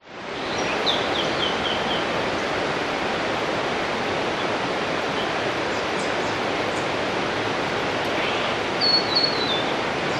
nature rainforest waterfall eve winter birds cockatoos